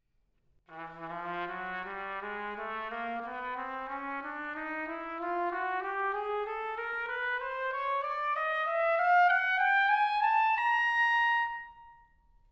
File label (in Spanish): trompeta